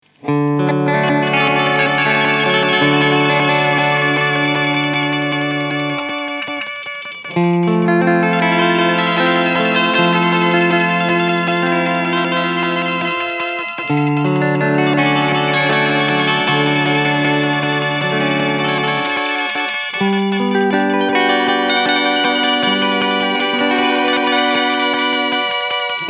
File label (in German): Pigtronix Echolution 3 Jump Modus Mod 12 Uhr; 24 dots